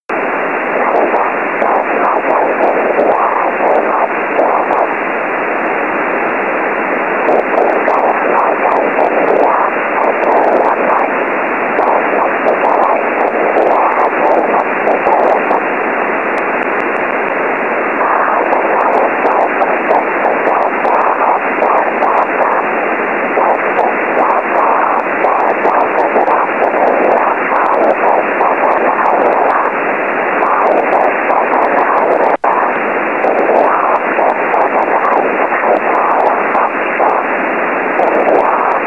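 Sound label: ssb aurora; gm8iem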